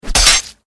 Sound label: sword strike body stab